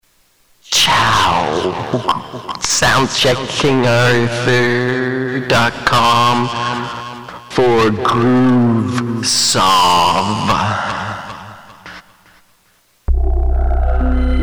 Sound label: groove sauve soundcheck